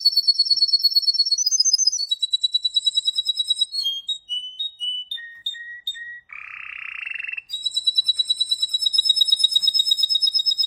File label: birdlife